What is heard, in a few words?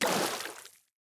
fall water